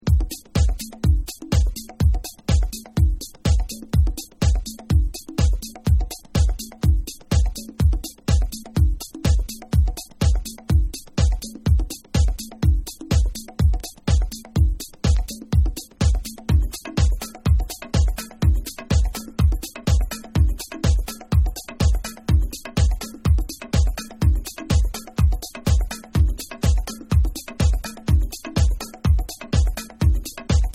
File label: Beats